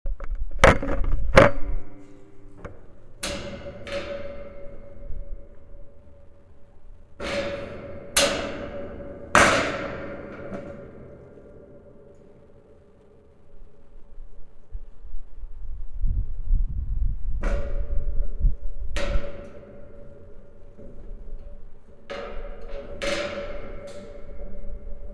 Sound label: grate; tascam